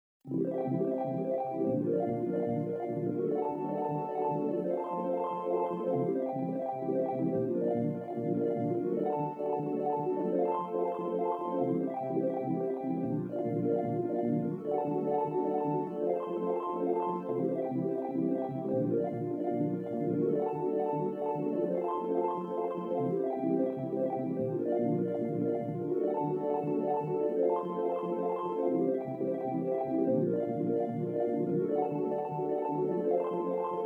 backing lead

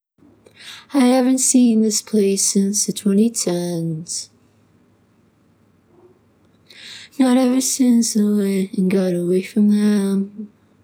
vocals clean

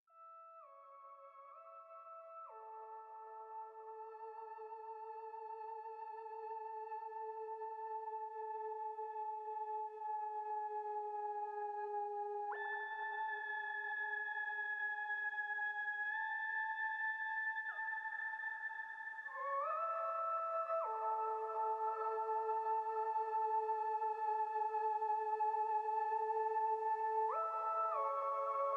lead synth

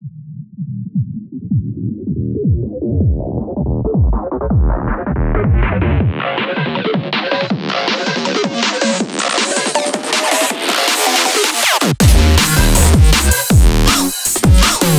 instrumental

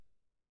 harmonies

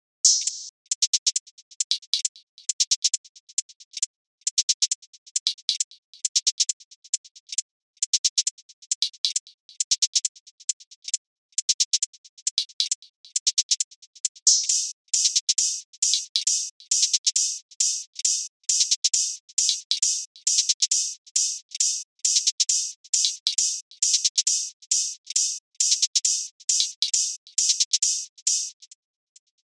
hihats

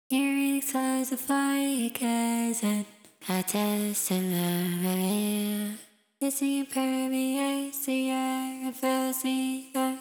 breath vox

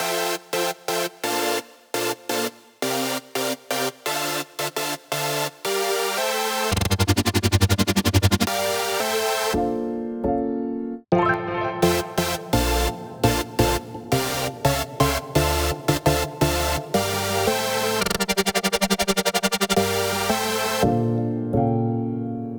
fmaj drop

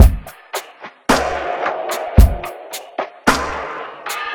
perc loop 110

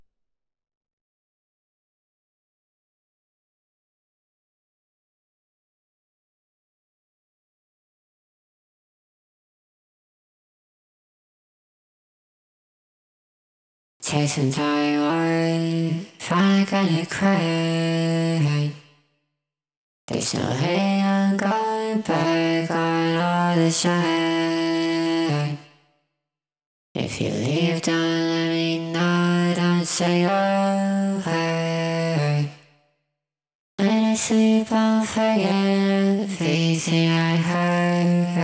vox clean

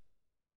high vox

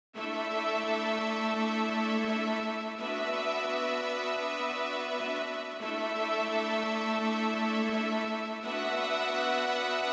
strings

